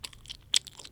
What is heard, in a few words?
mushy chew; dfcaverns; farming